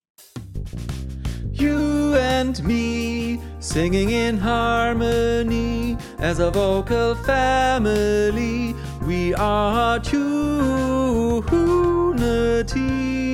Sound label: sopran unpitched